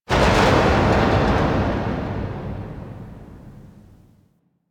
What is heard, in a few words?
Factory Thud; Ambience; Warframe; The New War; Quests; Zariman